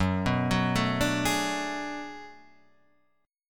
B Minor 13th Gb

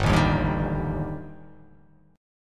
G Suspended 2nd Sharp 5th G

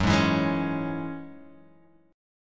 F sharp 7th Suspended 2nd, Guitar, Half Step Down

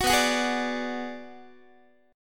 B Minor 6th B, Guitar; Bm6 strummed

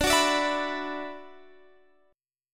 D 6th Add 9th D, Guitar, Standard; D6add9 strummed; x,17,16,19,17,19; JGuitar

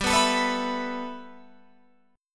G 6th Add 9th G Guitar Open F, chord; G6add9 strummed; 19,18,21,18,19,18

